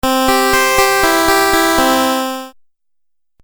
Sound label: Game Music Phrase; Video Game Sound Effects